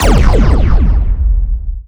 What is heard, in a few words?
Energywave Hit; Nicktoons Nitro; arcade